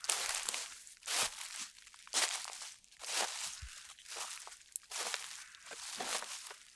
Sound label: Steps Loop, outdoor, Footsteps